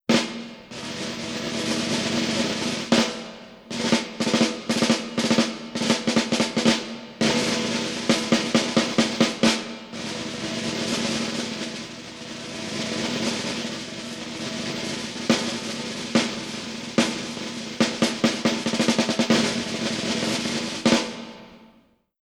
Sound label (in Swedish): Larm trumma